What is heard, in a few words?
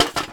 Metal Bucket, Old, Rusty, Set Down On Concrete; Objects, Household